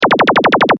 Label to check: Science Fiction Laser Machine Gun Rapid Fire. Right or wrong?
Right